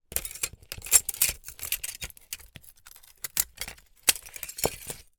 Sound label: foley glass peices shards handling movement